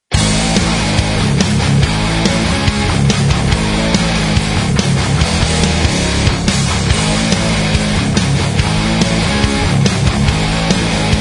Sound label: Symphonic Metal